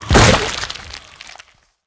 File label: Gore Explode; Legend of Keepers (gamerip) (2019)